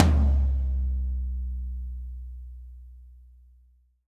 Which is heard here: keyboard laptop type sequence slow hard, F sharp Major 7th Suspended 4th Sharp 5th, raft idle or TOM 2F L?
TOM 2F L